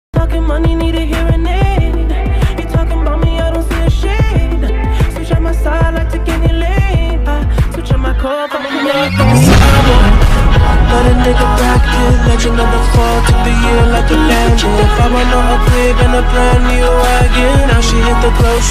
slowed english ringtone

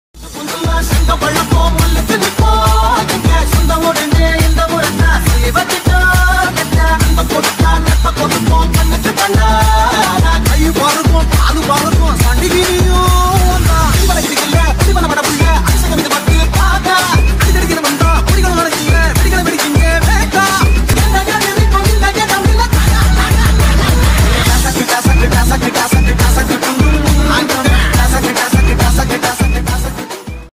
tamil ringtone

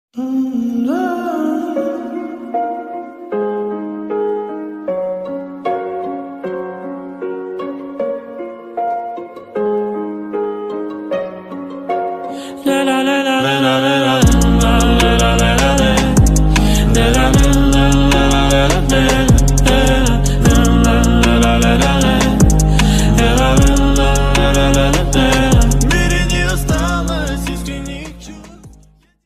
bgm ringtone

